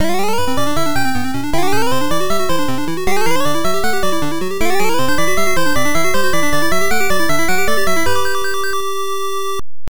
Block Clear; Lode Runner IV Teikoku Kara no Dasshutsu; arcade; Irem M62

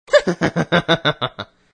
Laughs; Sonic.EXE; Tails Nightmare 3 Demo

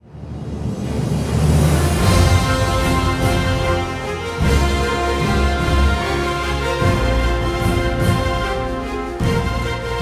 Elephant; ryse son of rome 2014

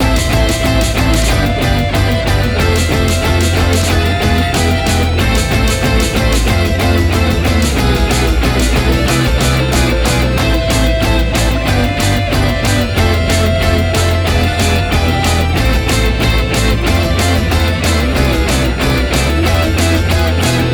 Mus Gameplay Level Bossfight E 185bpm

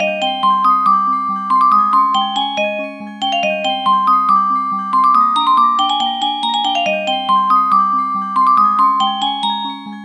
Musicbox; Io

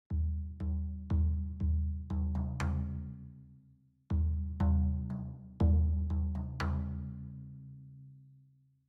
Citadel Music Drums; Frozen Flame; windows

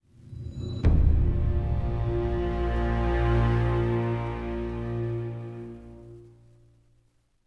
String; Assassin's Creed Unity Dead Kings

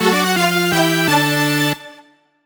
Jingle Gameset; Clubhouse Games: 51 Worldwide Classics

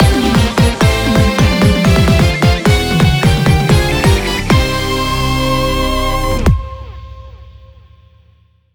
Jingle Finish

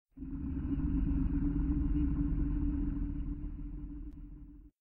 growl; pluto; Five Nights at Treasure Island 2020 Remake; windows

